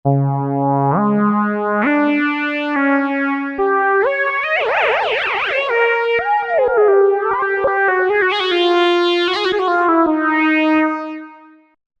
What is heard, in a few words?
Solo of Old, Roland V-Synth; Factory Patches